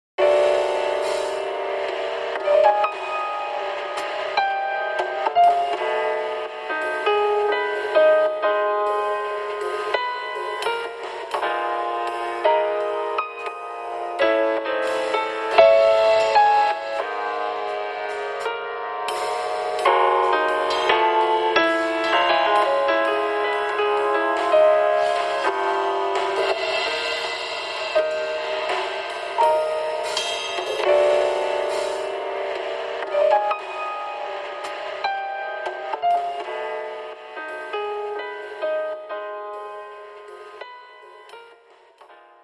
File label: Radio Jazz; batman arkham asylum